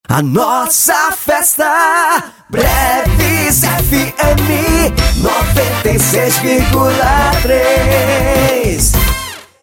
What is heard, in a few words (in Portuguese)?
vinheta